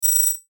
Chime Alarm Metallic Buzz; Production Element Imaging Element Accent Transition; Multimedia; Chimes; Blastwave

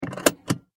transportation car seat belt click lock; Vehicles; McKinney Sound

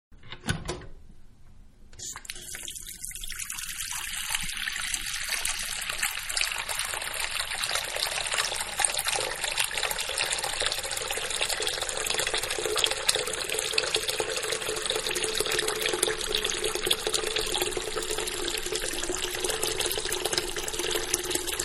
Sink Fill With Water Slowly; Bathroom; Household; Bathroom Sink-Filling With Water Slowly; Manhattan Production Music

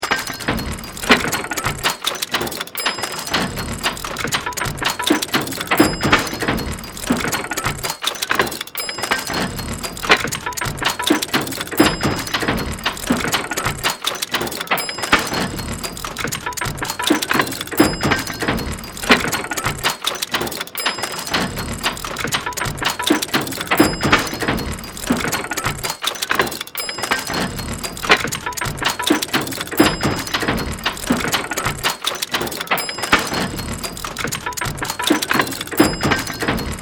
Steampunk Workshop, Loop; Steampunk, Workshop, Science Fiction, Loop, 5.1

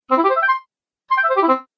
Fun Game Cartoon Descent And Ascent; Video Game

